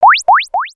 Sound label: Launch Pad Beeps; gnarled forest